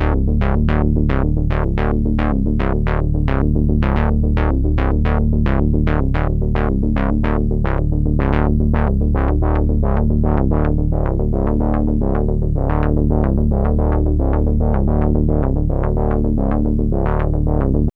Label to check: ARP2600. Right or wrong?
Right